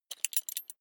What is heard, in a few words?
metal belt buckle clatter regular